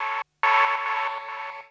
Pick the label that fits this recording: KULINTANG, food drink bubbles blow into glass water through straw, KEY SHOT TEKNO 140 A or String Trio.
KEY SHOT TEKNO 140 A